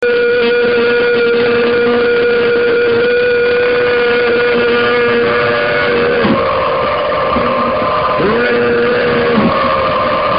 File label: ohhhh, cori